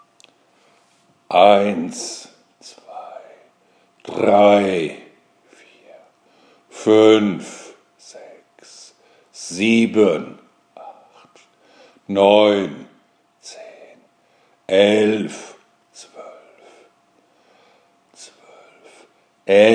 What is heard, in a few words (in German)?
Stimmübungen Stimmlippen